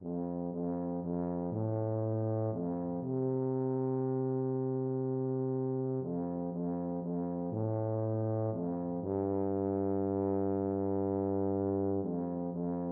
looby loo, tuba

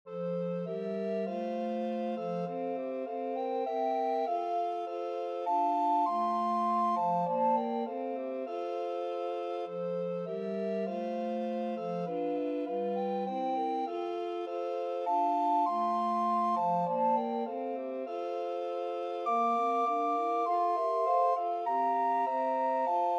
recorder quartet

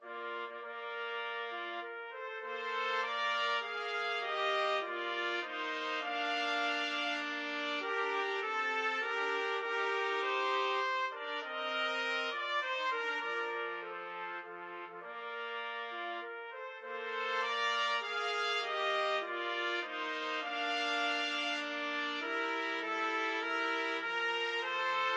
nocturne trumpet trio

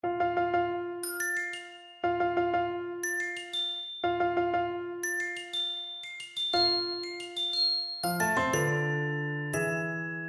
GL; mendelssohn; wedding march

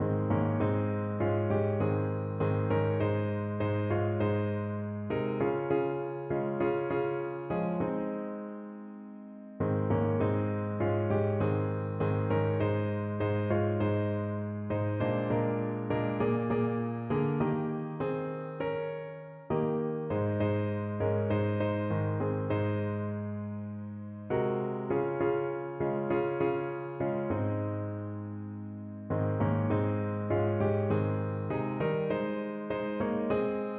since jesus came, piano

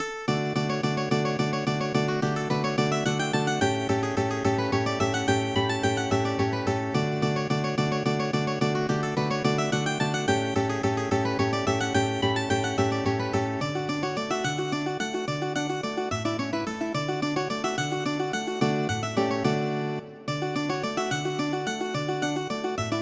mandolin guitar duet

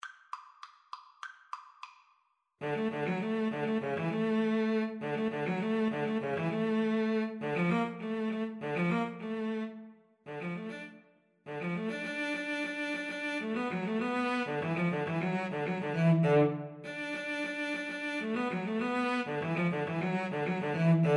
Cello Duet

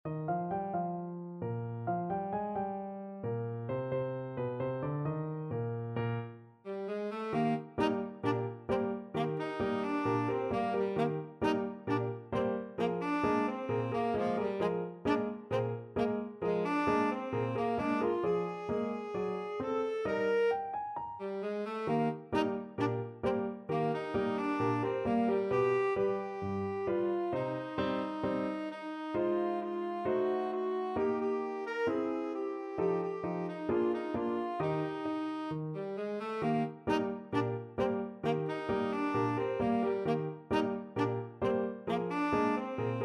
tenor saxophone

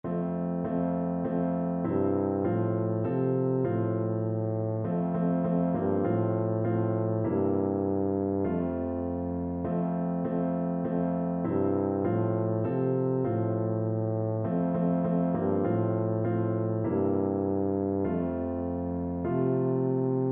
blood of jesus, tuba

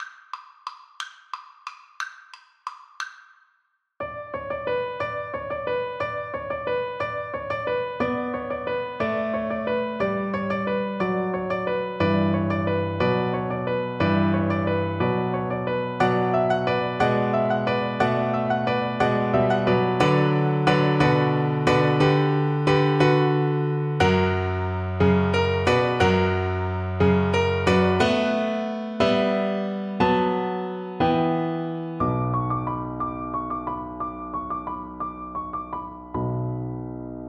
viola

